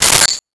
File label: Sword Hit, Weapons; 1001 Sound Effects